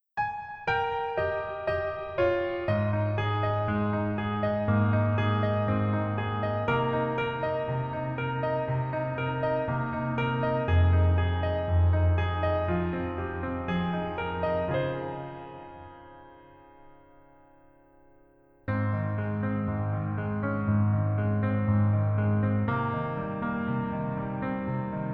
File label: Piano ver; 더킹, 영원의 군주